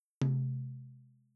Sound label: Drums Tom